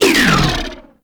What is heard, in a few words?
Ink Rifle End, Weapons; Warframe; Tenno